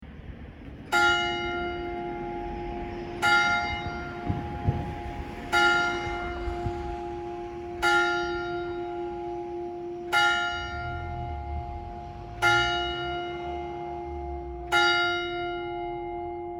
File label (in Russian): kolokol 12 chasov